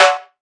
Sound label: high reggae snare; single hits